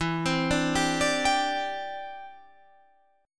E Minor 7th Sharp 5th E; Em7#5; arpeggiated; 12,15,12,12,15,15

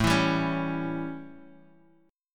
A 7th A, Guitar Open D; chord

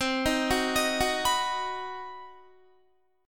C Minor Major 7th Flat 5th chord, Guitar; arpeggiated; JGuitar

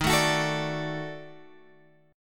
Eb Minor Major 7th Flat 5th Eb down Guitar Open G

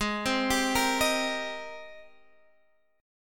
Gsharp Major 9th, Guitar, Open D; x,11,10,13,13,13

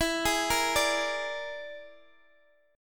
E 7th Flat 5th, down, Guitar, Open D

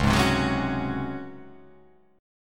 C sharp Major 7th Suspended 4th C sharp

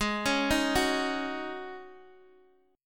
Ab 7th Suspended 4th Ab Guitar DADGAD; x,11,11,8,9,x

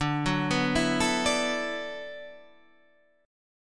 D 6th Add 9th D, Guitar, Half Step Down

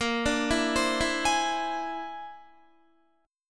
A sharp 9th Flat 5th A sharp, Guitar; arpeggiated; JGuitar

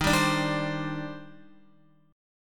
D sharp Major 13th, down, Guitar; strummed; JGuitar

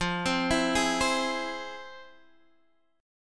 F Minor Major 7th, Guitar Half Step Down, chord

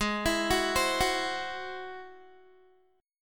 Ab Augmented 7th Ab down Guitar; Ab+7 arpeggiated

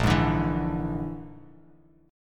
Bb-7th Flat 5th-Bb, Bass Standard, Bb7b5 chord; strummed; JGuitar

